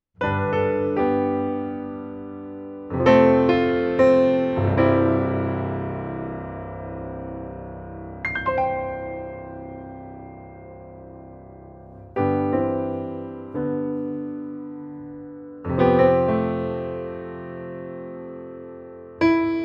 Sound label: Steinway D reverb